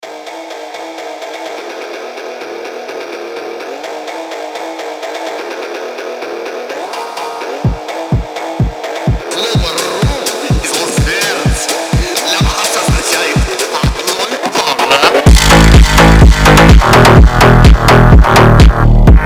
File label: phonk; amogus; dr livesey